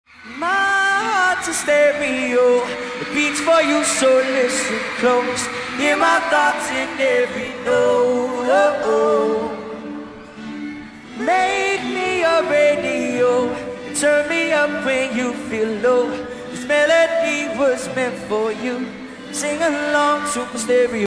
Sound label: vocals only